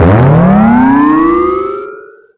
warp, video games

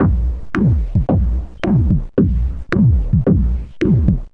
110 bass eggnogger; 101 to 110 bpm

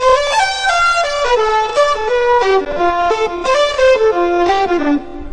stretchy jig, WORLD LOOPS

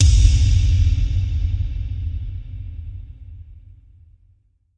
megaverb bd; Kicks; single hits

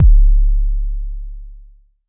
Jomox MBase, drum machine; Jomox MBase 01

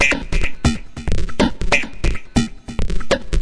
140 hexbeat, drum loops and breaks

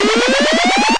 analog alarm up; Weird and Interesting Hits; single hits

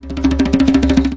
conga rollin, fills and rolls

